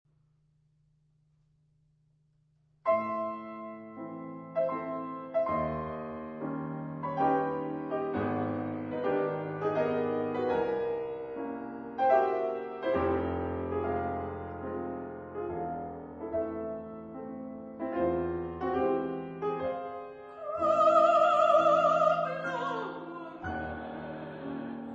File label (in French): Violon